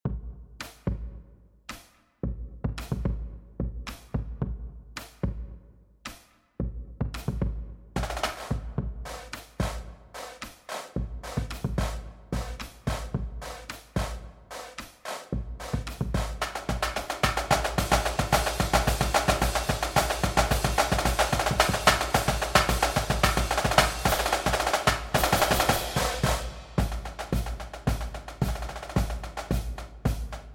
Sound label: Drumline; NDE Drumline Project